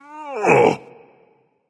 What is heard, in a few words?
ouch, voice; Smite; ymir skin14